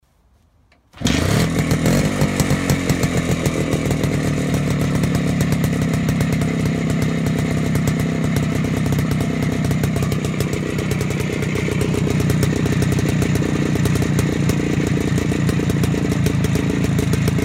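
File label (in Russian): звук мотоцикла минск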